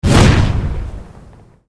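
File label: spell magician armageddon fire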